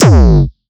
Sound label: Classic Synth Tom, One Shot Drums; Sonic Foundry (Sony Creative Software); Knutrix Drum & Bass